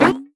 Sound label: bounce